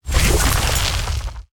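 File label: projectile; Smite; Vulcan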